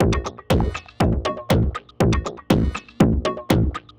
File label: Tech Beat 120 fx, techno; musicradar; 120bpm; Beats